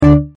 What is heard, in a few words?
drumm stopped; pharaohslotscasino